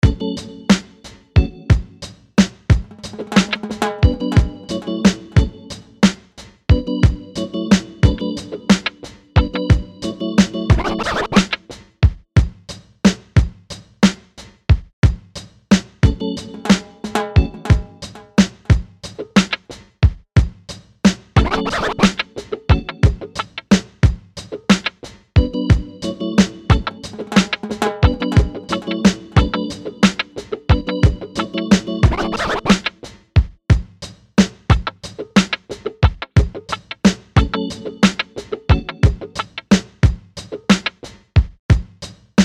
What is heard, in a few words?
Beat Rastafarian Organ Wah Guitar Timbales; Miscellaneous Loops